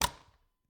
Cabin, front door, deadbolt, lock, latch, thick, open, stuck, hyper; Eiravaein Works; Vaeyan IV